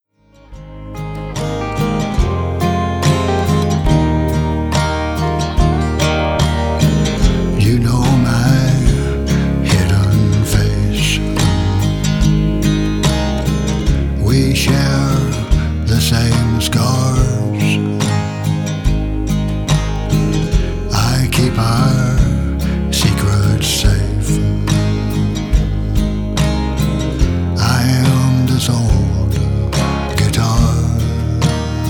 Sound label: old guitar